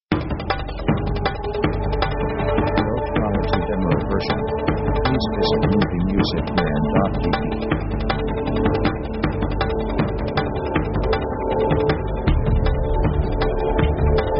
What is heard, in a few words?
synth action; synth themes